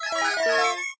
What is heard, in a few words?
cling; TamTamMicro